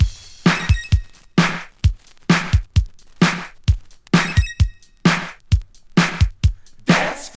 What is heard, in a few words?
131 bpm disco breakbeat f key, kick tuned to the F note